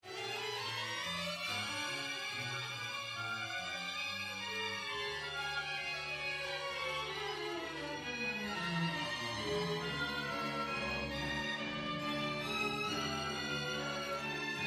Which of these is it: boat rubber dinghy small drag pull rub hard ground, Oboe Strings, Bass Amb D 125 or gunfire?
Oboe Strings